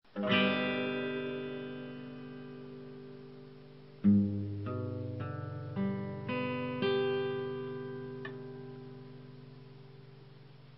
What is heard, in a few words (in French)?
Accord G